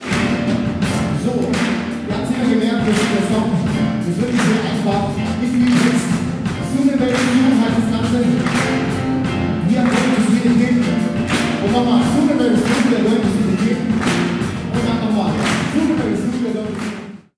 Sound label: Kirche Gospel